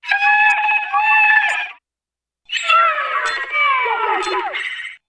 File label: high five awwwwwwwww; Human; Titanfall 2